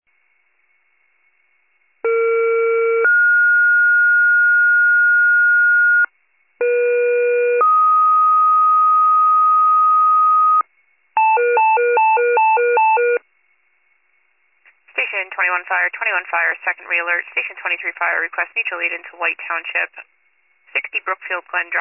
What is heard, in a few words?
fire, pager alert